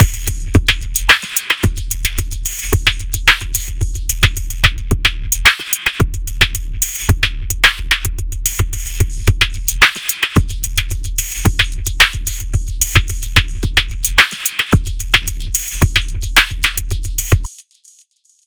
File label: drum loop drum tickle